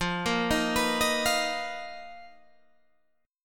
B Major 7th Flat 5th F, chord shape

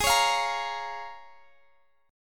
Bb Minor Ab; Bbm/Ab strummed

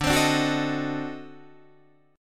D 7th Flat 9th D down Guitar Open D

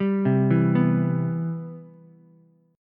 C Major 7th, C, down, Ukulele; arpeggiated; CM7

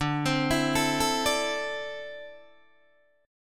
D 7th Suspended 2nd down Guitar Open D

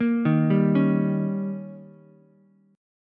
Eb 7th, Ukulele; Eb7 arpeggiated; JGuitar

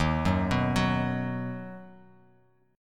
Eb Minor Sharp 5th Bass Standard; Ebm#5 arpeggiated; JGuitar

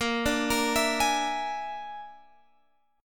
A sharp 7th Flat 5th A sharp, Guitar Open G; down

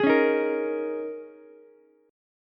Ab Suspended 2nd Suspended 4th Ab down Ukulele; strummed; JGuitar

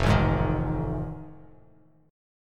F 7th Sharp 9th, Bass Standard; F7#9 strummed

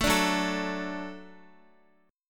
Gb Minor Sharp 5th Gb Guitar; strummed; JGuitar

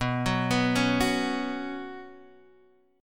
B Suspended 2nd, Guitar, Half Step Down

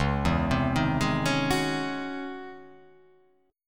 Db Minor Major 11th Db; arpeggiated; JGuitar

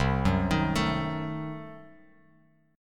C-Diminished 7th, Bass Standard, chord; arpeggiated; JGuitar

